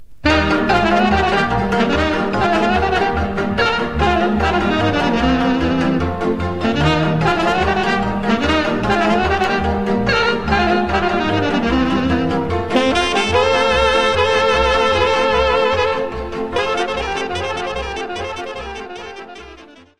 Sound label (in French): Hora - Roumanie